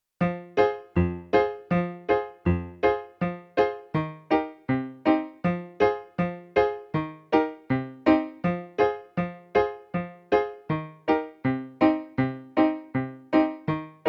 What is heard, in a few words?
backing track; ks1 musical storytelling